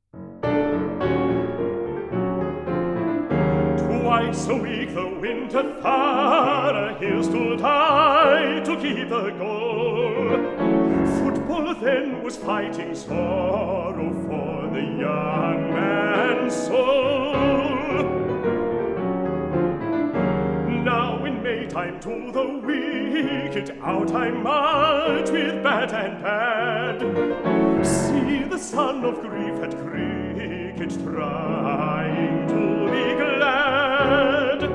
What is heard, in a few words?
Piano solo; John Ireland